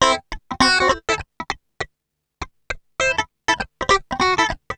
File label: FUNK, FUNKY GTR